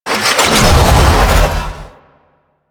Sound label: Moon Air Lock Open; Ambience; Warframe; Orokin Moon